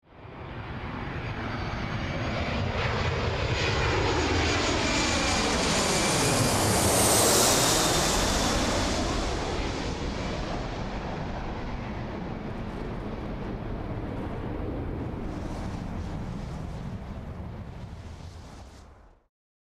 Airplane landing sequence airport distant; vehicle

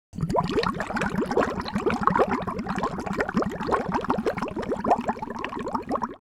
Cartoon bubbles; bubble sound effects

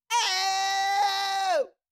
Cartoon little monster voice shout scream long

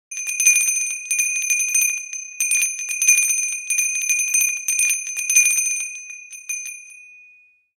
Small handbell ring long version

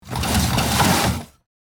Heavy toolbox dragging; hand tool

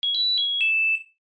Appliance power on jingle startup; Home and Household

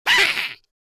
Goblin emotion surprised

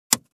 Cassette tape recorder push stop button

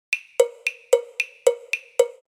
Cartoon walk percussion

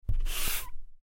Chalk eraser swipe; Chalk and Chalkboard

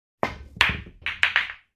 Pool break shot

